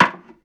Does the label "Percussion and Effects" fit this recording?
No